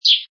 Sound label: Sparrow, Little sparrow bird tweet or bird chirp; Birds; Animals